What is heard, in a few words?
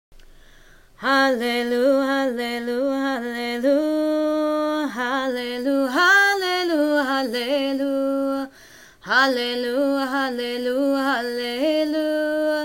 Sufi chant; MorningPrayer; Psalm 150